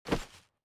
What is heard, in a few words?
bag Drop